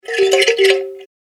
Rusty Old Music Box, toy melody; Andrew Fleming

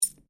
COIN PENNY SINGLE DROP HAND; Common coins; Money; Objects

